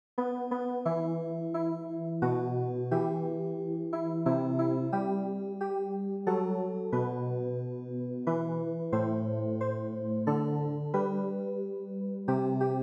CLAVIER

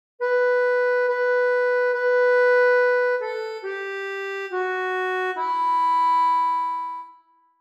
Viens Seigneur Et Sauve Nous ACCORDEON

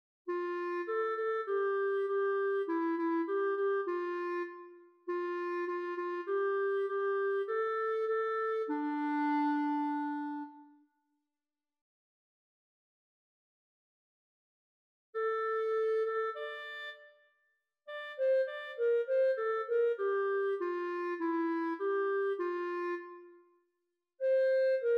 CLARINETTE

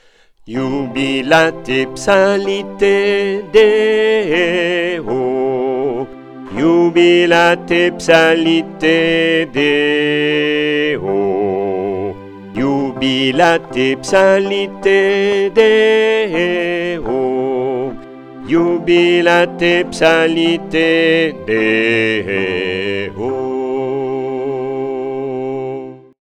Coda BASSE

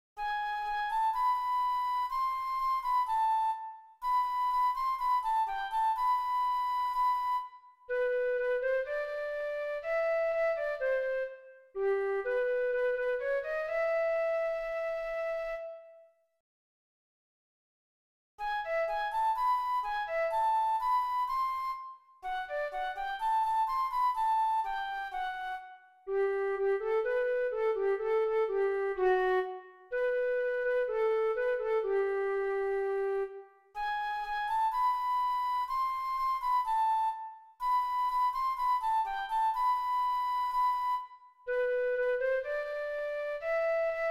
FLUTE

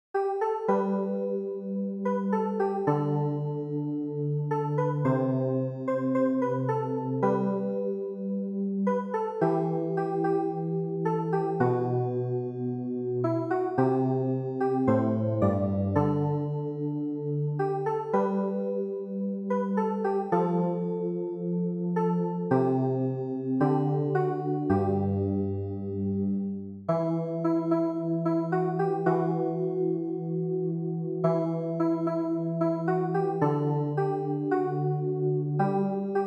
clavier

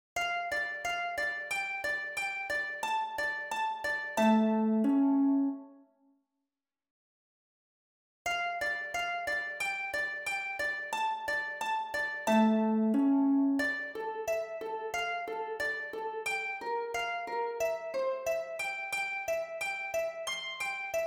HARPE; AGNUS St Jean

